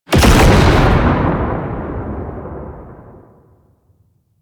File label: Alchemy Transmuter Despawn; Warframe; Entrati